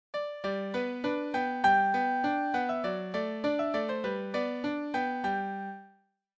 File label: Alberti bass; Clementi Opus 36